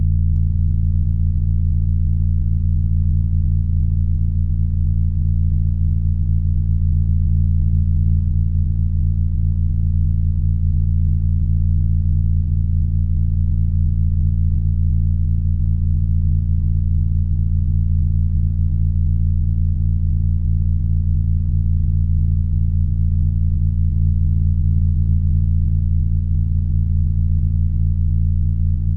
no input feedback loop low deep pulsating clean tonal; sonniss gdc